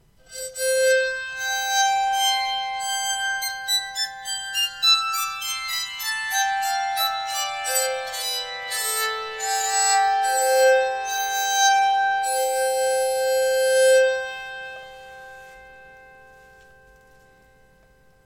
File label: scale, bowed psaltery